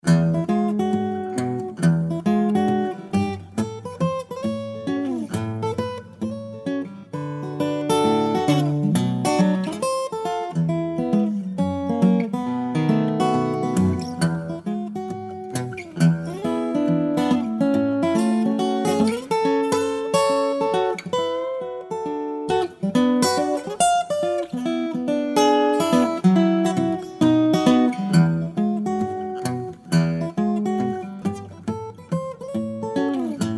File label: six strings rag mix